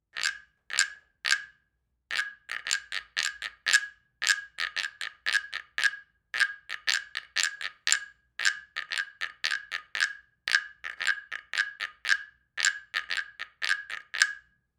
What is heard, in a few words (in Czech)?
hraci zviratko iguana; troton